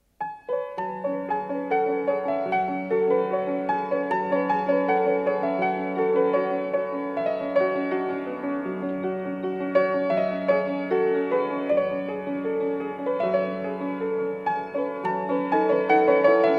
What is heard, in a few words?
prelude piano